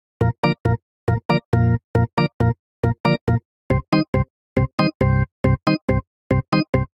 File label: Progression Reggae Organ Bubble I - ii Bb Major 138 bpm; Electric Keyboards; Ableton; Factory Packs